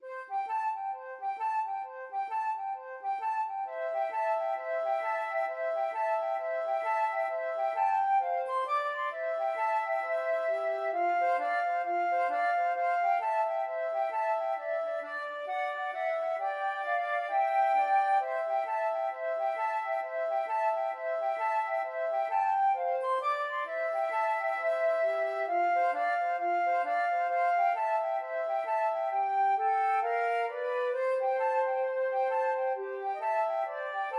jingle bells boogie flute duet

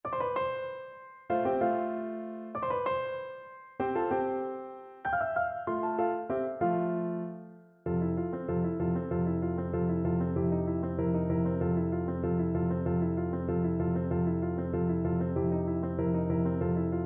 horn, French Horn

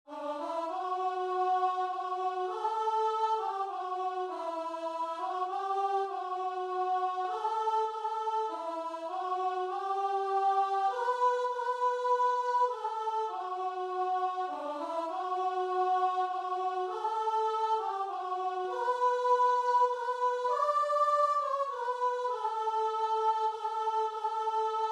just as i am, guitar and vocal

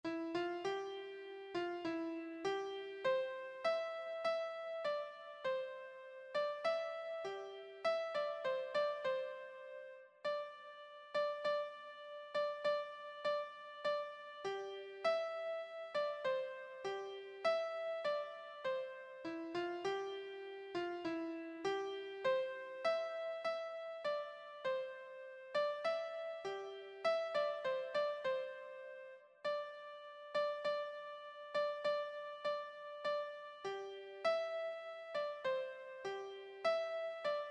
lovely christmas morning, keyboard

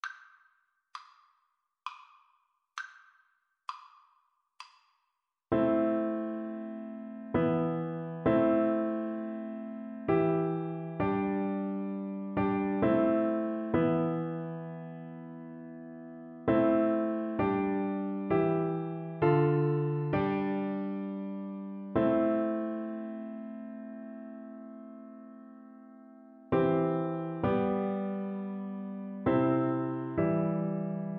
coventry viola

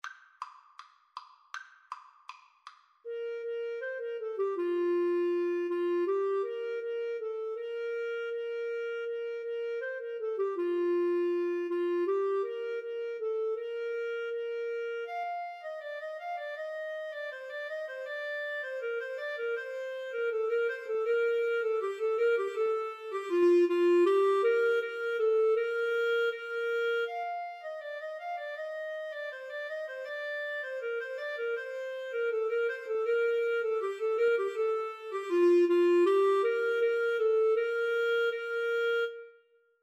ding dong, Clarinet Duet

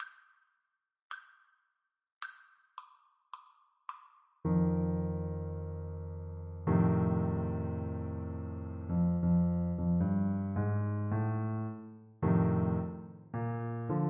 ASAX

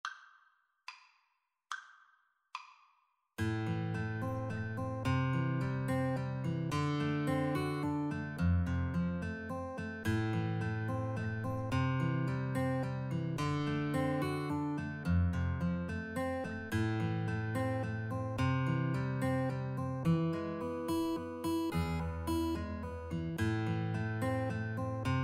House of the rising sun, Guitar Duet

